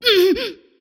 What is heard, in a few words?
pain; antimage